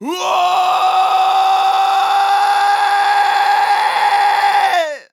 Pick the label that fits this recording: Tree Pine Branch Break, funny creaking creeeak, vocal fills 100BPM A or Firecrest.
vocal fills 100BPM A